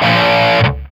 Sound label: SOLDANO ROCK, Guitars